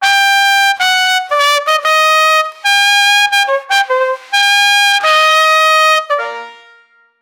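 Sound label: ultra fast circus trumpet, fanfare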